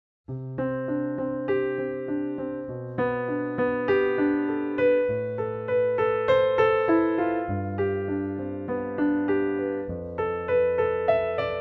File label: Piano; TAXI; meditations